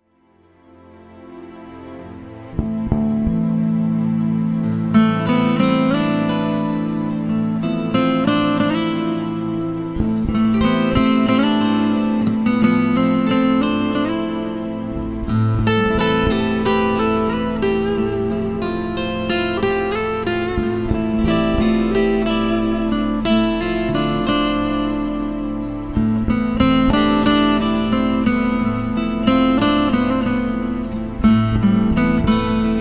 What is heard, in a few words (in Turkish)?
Gitar